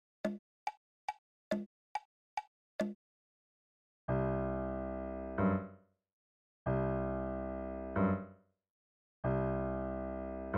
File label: Duet; Hearse